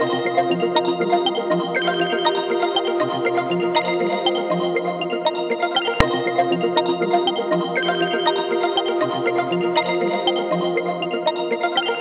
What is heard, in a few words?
space music